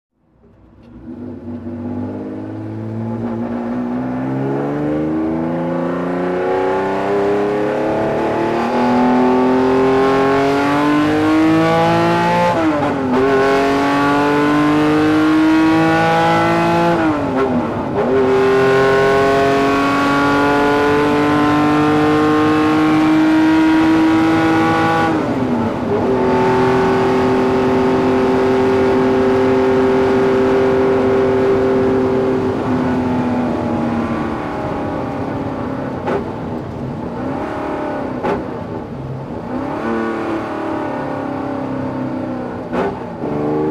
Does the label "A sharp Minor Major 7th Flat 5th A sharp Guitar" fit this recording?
No